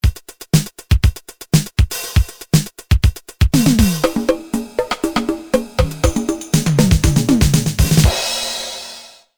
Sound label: linndrum